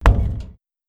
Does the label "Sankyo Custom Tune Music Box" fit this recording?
No